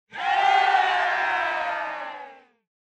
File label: Cheer Yay Low Short Solo